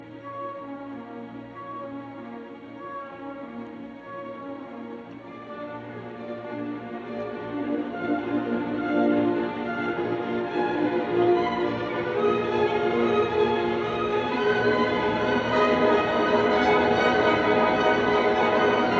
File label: Symphony in E flat major Romantic; Bruckner; WAB 104; 1881 version ed R Haas; IV Finale